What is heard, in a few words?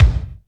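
bass drum, Roland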